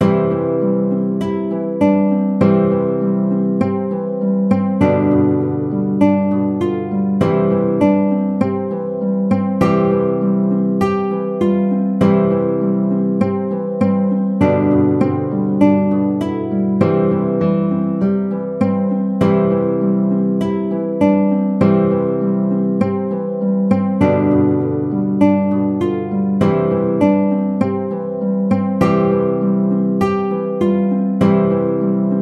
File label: Go Tell Aunt Rhody Guitar